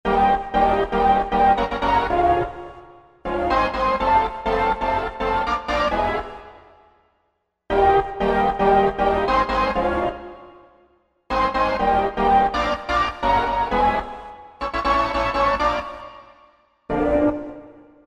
5th Choir hit, Korg TR-Rack

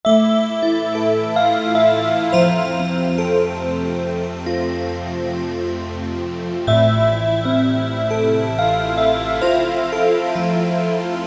God Chimes

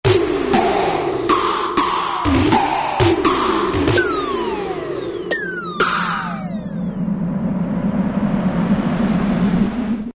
Manic Shots; Roland M-DC1

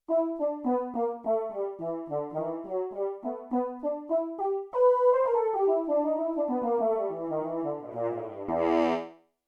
Horn Chorus; Yamaha TX16W

